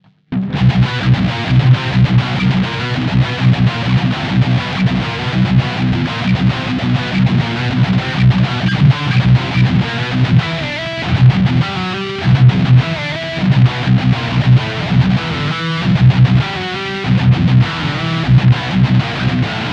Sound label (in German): Beide Pickups voller Druck